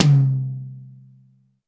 roomy tom sample c key

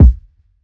perfect kickdrum b key; tuned drums

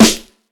short reverb steel snare drum A key

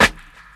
old school dope reggae snare single hit A key

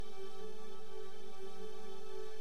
background texture modulated synth 100 bpm; instruments; loops

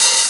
raw open hat c key

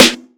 good snare b key

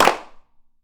clap sound d sharp key; single hits one shots

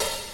dampened open high hat sample d key

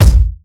steel kick drum sample a key; kick drum samples

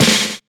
snare d sharp key; snare drum samples

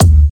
hip hop kickdrum sound d key

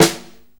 shiny snare one shot E key

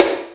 rich low end pop steel snare drum sound f key

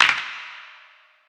huge clap one shot f key, percussion; single hits one shots

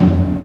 tom one shot f key; tuned drums f key

